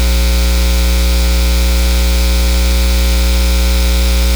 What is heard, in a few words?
Midi Click No Midi Input; RME